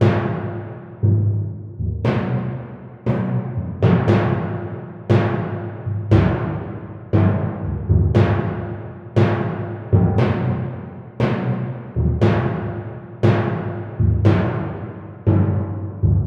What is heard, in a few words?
timpani; 118 bpm